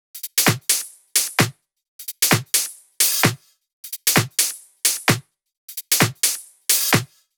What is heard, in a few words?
130BPM Kit; Vengeance Samples; Take Top Kit